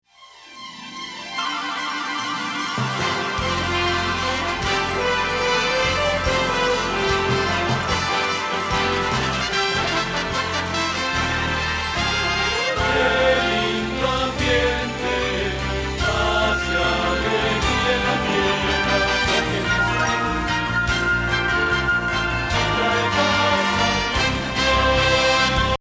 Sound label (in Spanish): Bajo